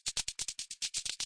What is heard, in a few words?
red riding hood move; fairyred